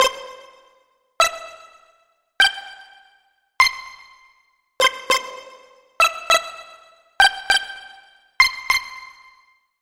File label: SFX Press Button; Routed Sound; Vital Expansion - Hyper Gamer Vol.1